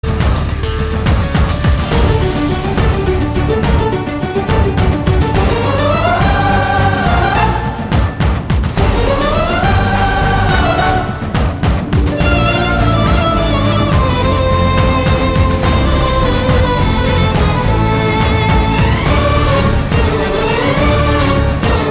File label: Karaoke BGM Ringtone; Bhoomi; Tamil